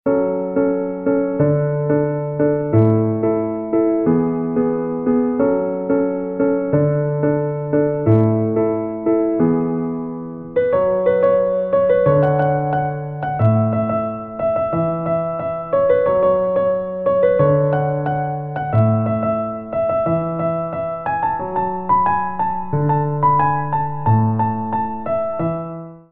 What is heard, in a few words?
piano ringtone